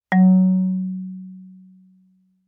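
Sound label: Marimba yarn fortissimo Gb2; MIS Pitches - 2014; Percussion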